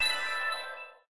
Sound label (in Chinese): 魔法; webxoss